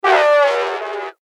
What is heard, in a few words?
creatures imaginative